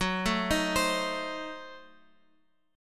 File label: F sharp Diminished 7th Guitar Open F; arpeggiated; JGuitar